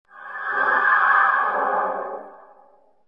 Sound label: cloak klingon